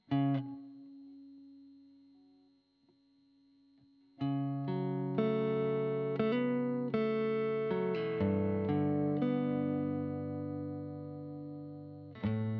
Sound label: GTR Royer